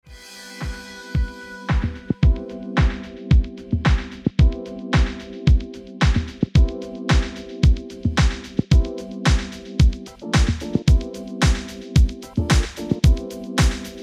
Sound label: Instrumental